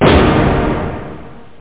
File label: fortissimo hit